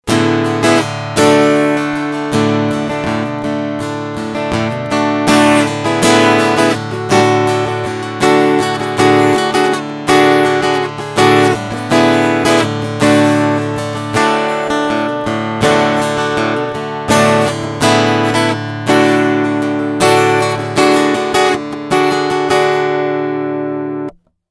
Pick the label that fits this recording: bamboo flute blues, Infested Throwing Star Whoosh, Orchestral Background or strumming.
strumming